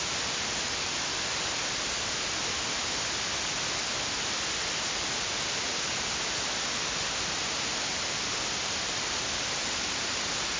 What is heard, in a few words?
Noise White Stereo